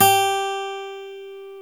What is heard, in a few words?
GTR, Dual Guitar, Roland; Club-50 - Foundations